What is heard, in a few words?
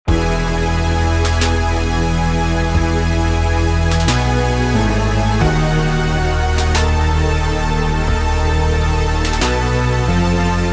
Stone Gate Closing, 8bit Dungeon; game